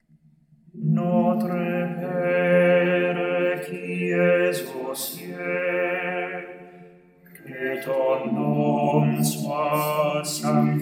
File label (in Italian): Bassi